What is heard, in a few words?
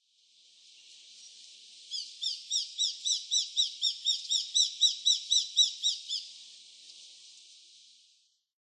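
Japanese sparrowhawk, utsunomiya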